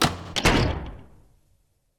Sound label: bang